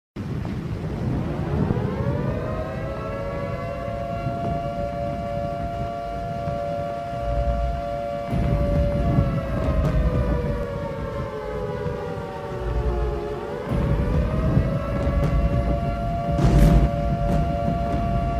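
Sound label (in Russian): bombejka, bombing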